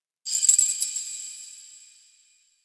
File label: Fortress Chains Small; Ambience; Warframe; Grineer Fortress